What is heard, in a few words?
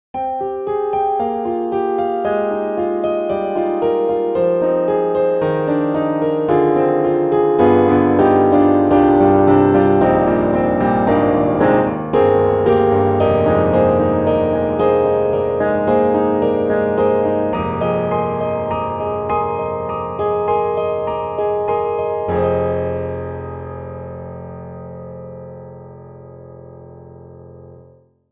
Pianoteq Grand C2 concert; MODARTT S.A.S.